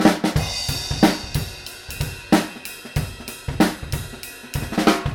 Fill Beat Ride

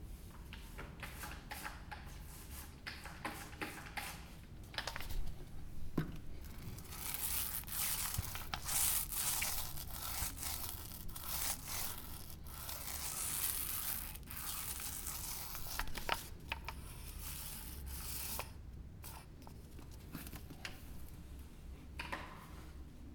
Painting

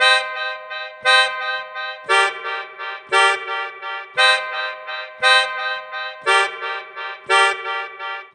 115 live skank

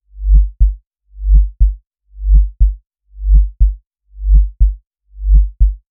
Futuristic Heartbeat 60 Bpm

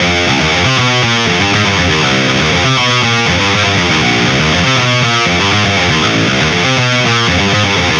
Guitar Riff Pentablues

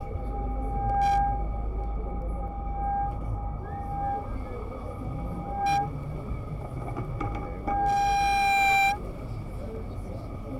Binaural Feedback

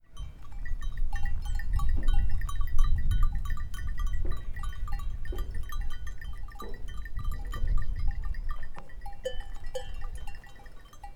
Goat Bells

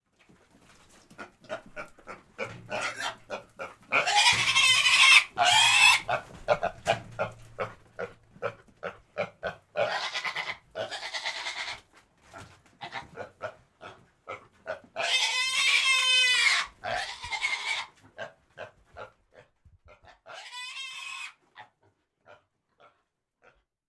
Pig Squeeling

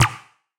Laser Pistol Gun

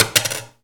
Tock Tackatack

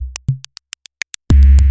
Rhythm maker Randomized 140 Bpm Loop

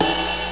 ride

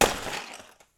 Box Smash

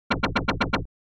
Laser Gun

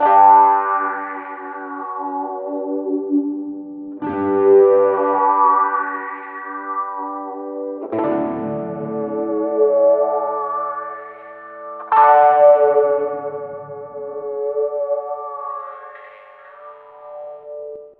Wah Two Electric Guitar

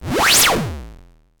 Bit Woosh

